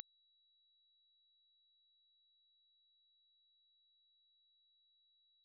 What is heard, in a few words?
sin, 96k